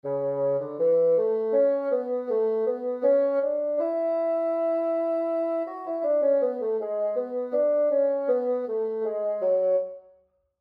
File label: Bass Clef Example